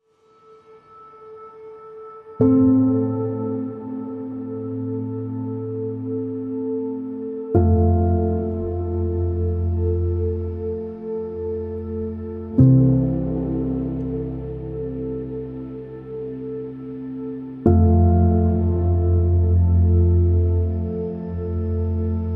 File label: Piano and Background Atmosphere